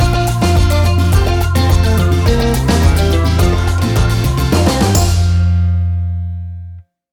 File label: Bouncy Reggae Island Music Stinger; A piece of cool reggae caly